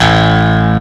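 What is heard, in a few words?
Distorted Piano low; instrumente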